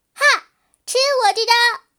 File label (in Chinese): 使用技能, BattleCry; 莉莉